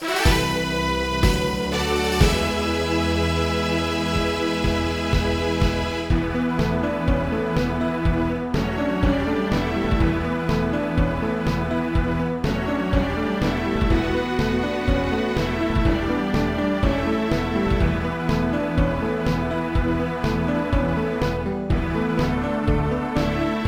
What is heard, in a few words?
Victory; Marica Shinjitsu no Sekai; Saturn